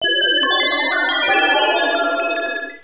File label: churn